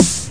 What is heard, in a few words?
electro snare; Electro Kit; STYLE KITS (Hip Hop, Etc); Boy In A Band Electro Kit